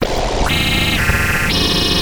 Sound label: Machine Jet Buzz